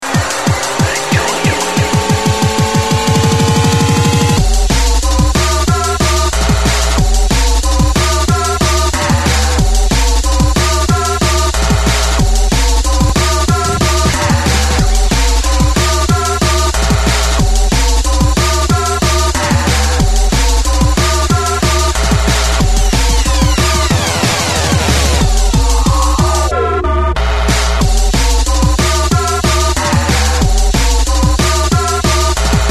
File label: drum and bass; world of drum and bass; jungle